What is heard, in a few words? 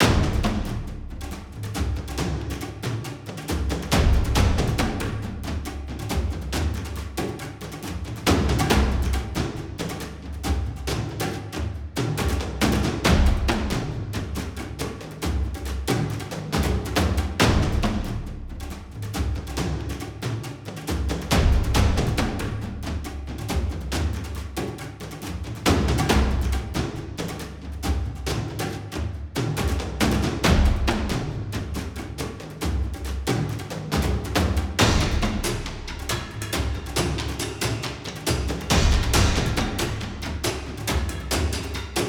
Explore PERC; skykeepers; ps4 windows xbox one gamerip 2017